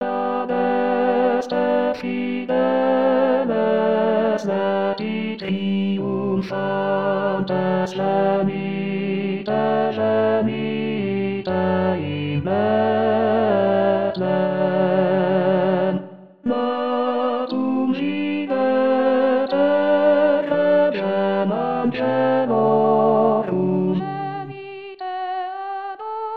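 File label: tenor; Adeste fideles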